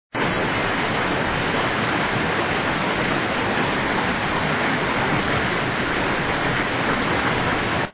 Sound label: safari water run; Quicksilver